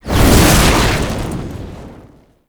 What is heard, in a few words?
Flight Start; Warframe; Uriel; Infernalis